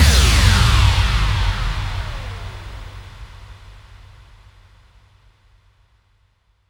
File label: Bassdrums FX, FX Kicks; Vengeance Samples